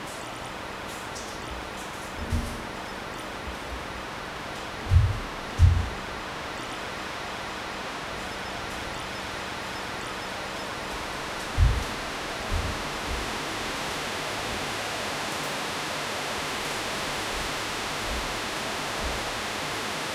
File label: pov from inside a cozy, rain on glass